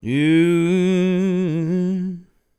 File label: MOANIN, Vocal Planet Blues & Soul; ILIO; Vocal Planet VOL-2